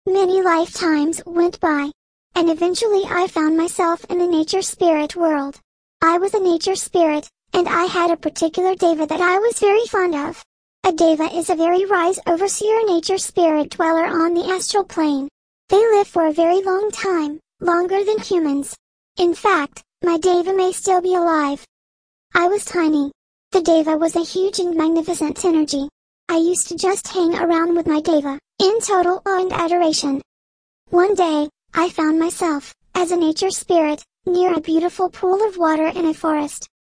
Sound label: nature spirit rate medium pitch x high